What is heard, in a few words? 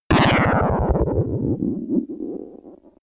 torpedo shot